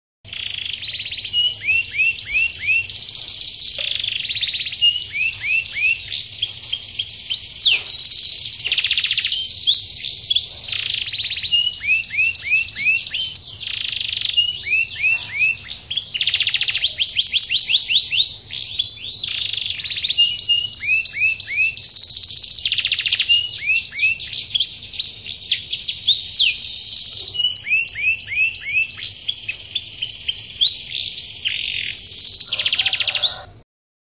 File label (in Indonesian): audio kenari jeda 30 detik